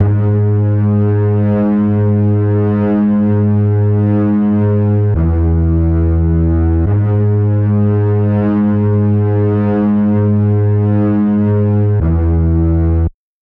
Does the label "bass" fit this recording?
Yes